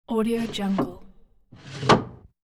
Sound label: wooden drawer open close